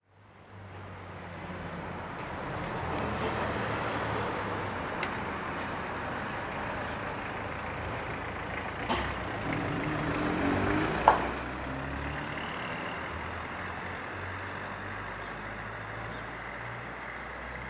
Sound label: kebap long